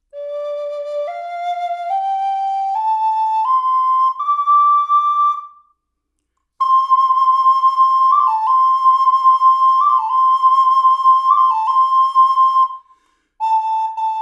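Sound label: reverb, flute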